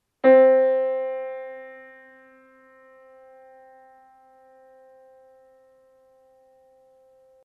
piano middle C

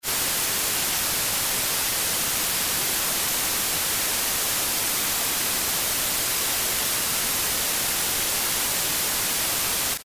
white

